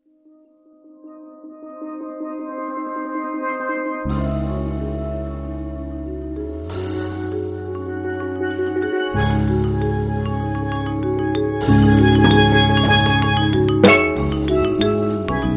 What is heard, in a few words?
Groove